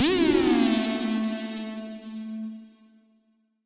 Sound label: Siren Horn down, Ramp Down, A; future rave